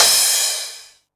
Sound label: Crash, Cymbals; Vengeance Samples